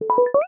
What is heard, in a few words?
ping pong, notifications; Reality Bytes